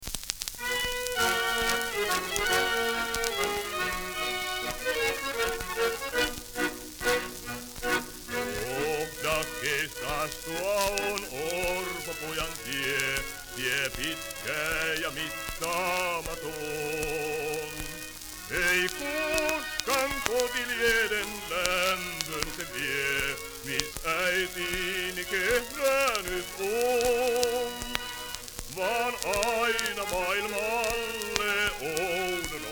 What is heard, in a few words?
Polyphon